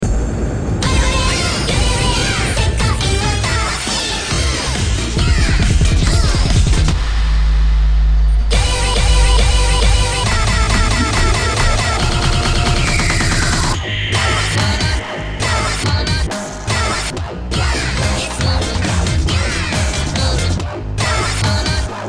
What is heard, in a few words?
DUBSTEP remix; nyaruko-san